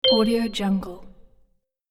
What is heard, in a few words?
super marimba ding